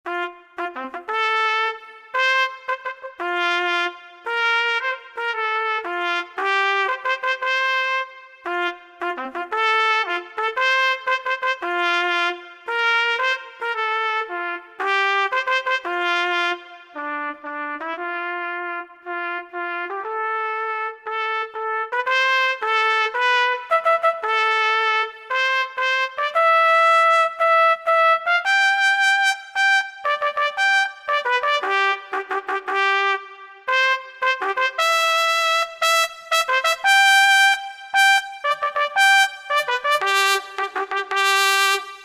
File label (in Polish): hejnal